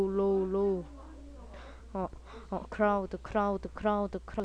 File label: crowd